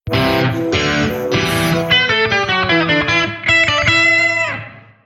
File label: Jingle; Kalashnikov collective